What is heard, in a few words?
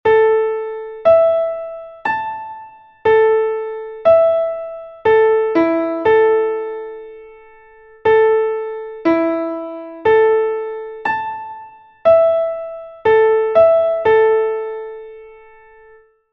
seven perfect intervals; Essentials of Music Theory: Elementary